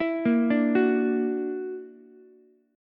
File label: Gb Augmented 7th Gb down Ukulele; Gb+7 arpeggiated